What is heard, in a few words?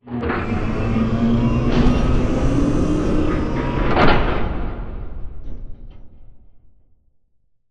Robot Arm Final Station Up Close; Boomtown; Titanfall 2